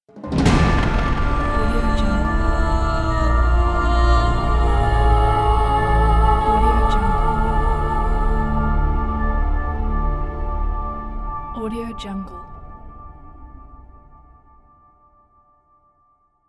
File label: cinematic fantastic intro